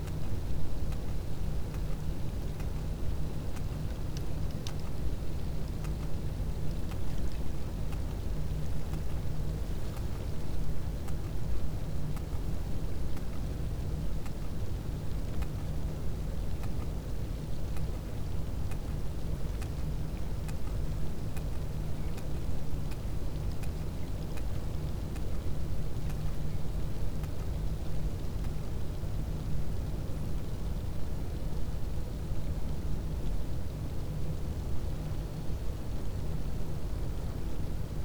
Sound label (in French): cachalot positif, Physeter Macrocephalus